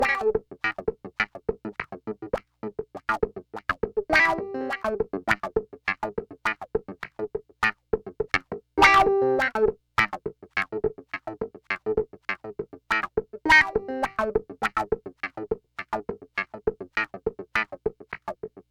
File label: Clav Rhythm Key of A 102.60BPM